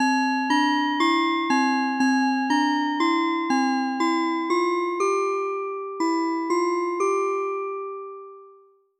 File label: frere jacques with harmonize